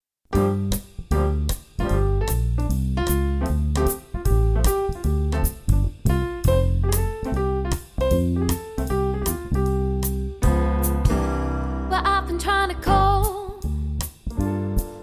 jazz

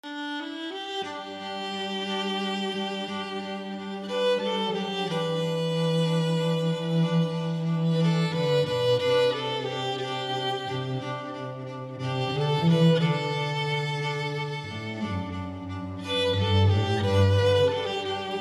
ensemble; classical musicians

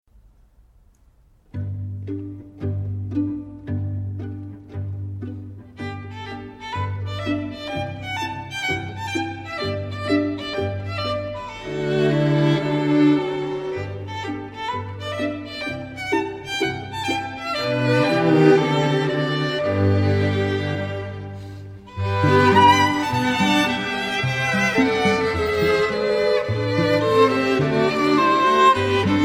strings; Dvorak Humoreske

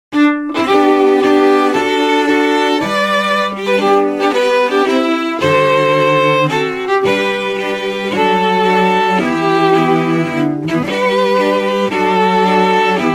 strings

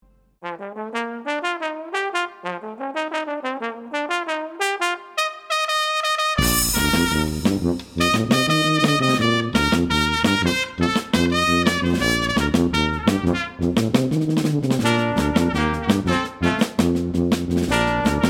brass band

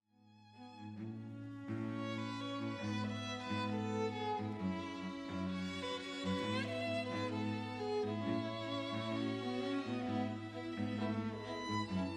strings

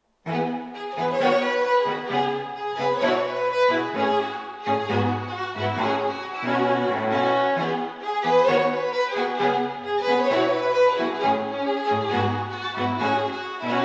strings

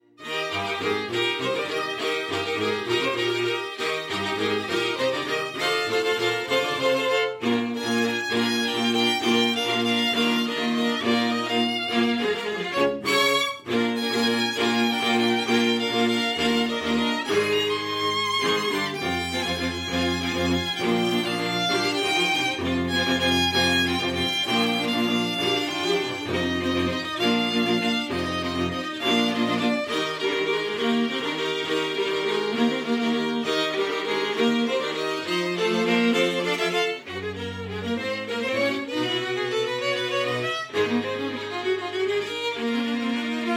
strings

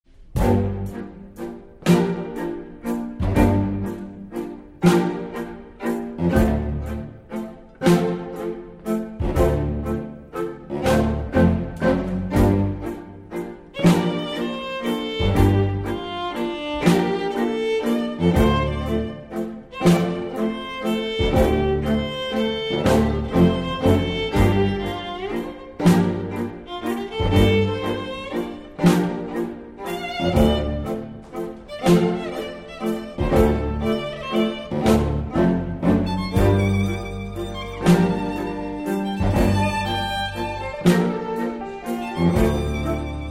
strings; classical musicians

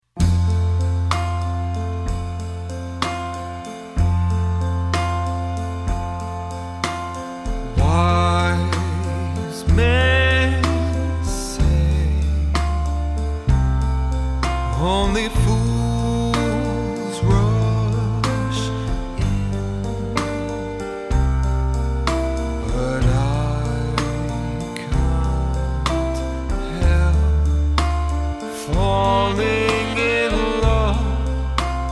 swing band; jazz swing bands

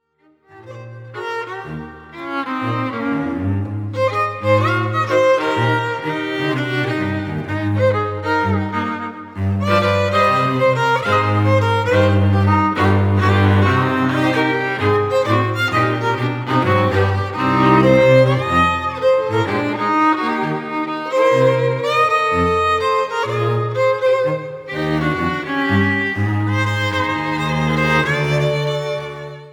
strings

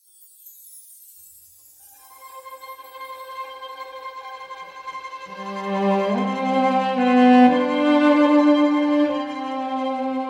strings, professional string ensemble

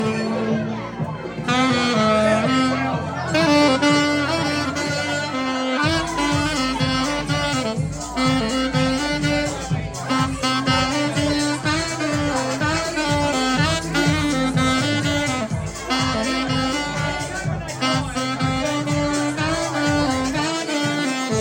sax

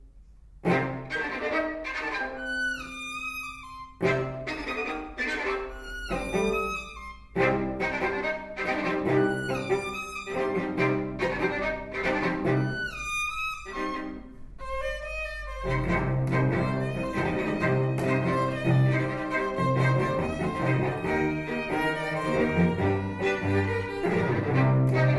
strings; classical musicians

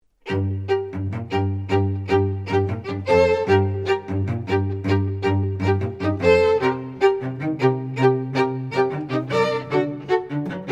strings; classical musicians

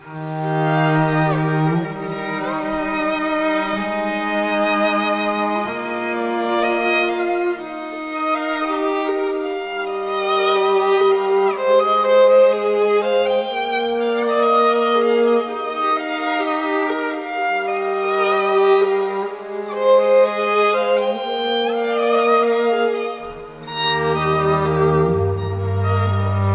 strings; classical musicians

